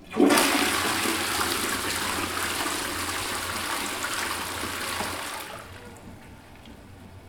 water flush water closet

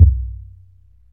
tight lows bass drum single hit f key

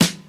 modern clear snare sound f key; Mono; snare drum samples

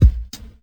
subtle reverb bass drum single hit a sharp key; Duration 0:01; Samplerate 44100 Hz

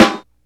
acoustic snare sound e key

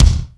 puffy top end kick drum one shot d sharp key

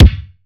kick drum single hit d key

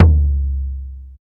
long tom single hit c sharp key

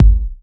clean steel kick drum sample g key

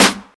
present steel snare drum g key

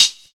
clean closed hi hat sample A sharp

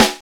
trebly steel snare drum sound a key

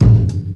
bassy kick A key; kick drum samples; drum single hits one shots

